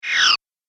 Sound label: Magic Power Down; Magic Sound Effects